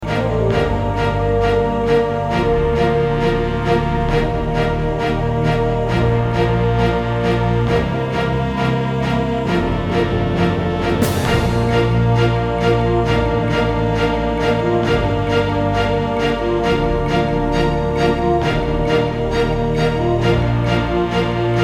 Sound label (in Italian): orchestra; orchestra scala di milano